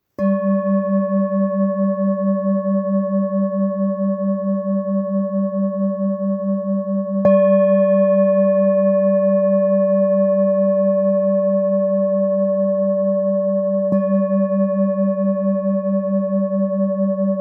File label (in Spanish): Sol, cuencos tibetanos